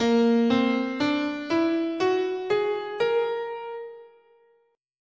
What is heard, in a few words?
Asharp Whole Tone